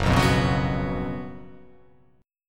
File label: F sharp Major 7th Suspended 4th